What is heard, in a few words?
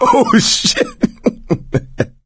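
laughter, male; Left 4 Dead 2; coach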